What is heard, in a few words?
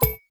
health restored quiet